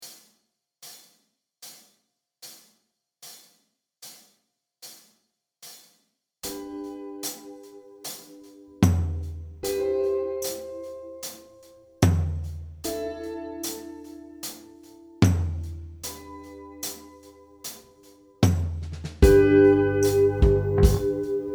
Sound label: Acoustic Track Backing Track, Guitar; Guitar Control